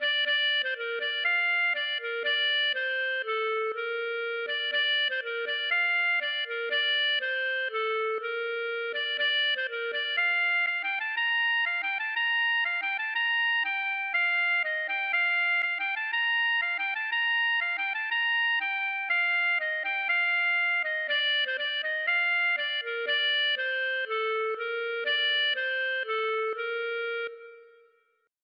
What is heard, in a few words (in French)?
marche